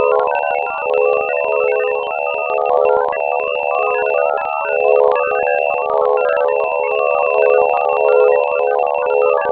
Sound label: 60hz noise; eeg